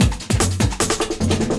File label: LOOP, 150 BPM; Ueberschall; Drum & Bass Flash Trackz